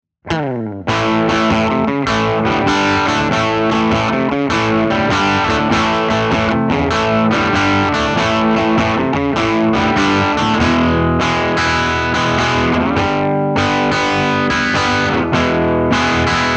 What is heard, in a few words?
characteristic sound; jensentone